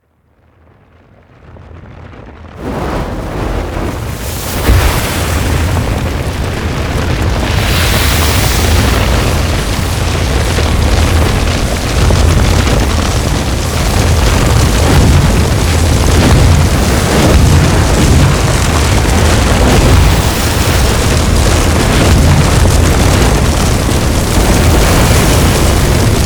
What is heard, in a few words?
Fortress Bridge Door Dissolve